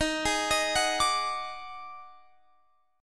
D sharp Minor Major 7th Double Flat 5th D sharp Guitar Open F; arpeggiated